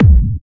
Kick, Drums; Bonus Drums

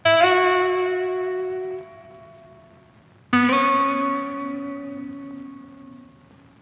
Various Melody Loop - 145 BPM B Min Lead; Loop Stems; Cymatics; 2020 Melody Collection; Various Melody Loop 6

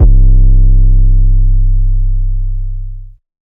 808 (C); HipHop; Hip Hop and Trap; Ghosthack; 808s

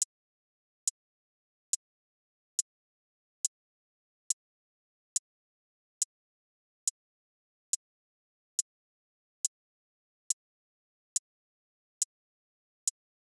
Drum Loop, 70 BPM Hihat; Cymatics; Vibes II Drum Loop Collection; Dialed Drum Loop

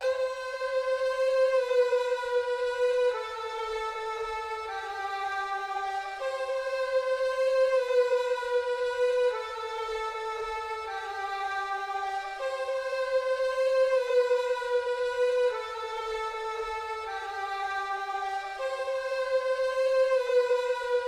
155 BPM E Min Strings, loop stems; Cymatics; 2022 Melody Collection Platinum Expansion - Pt 1; Dubai

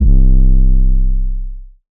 808 C; Advent Calendar - Day 9 - 808s

